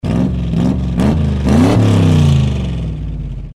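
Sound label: pistons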